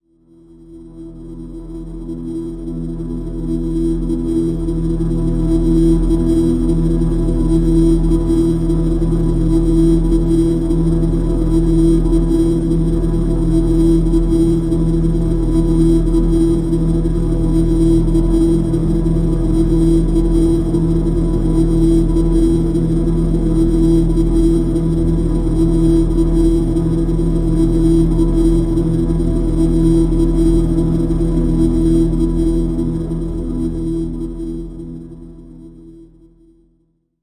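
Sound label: drone E; Neutrality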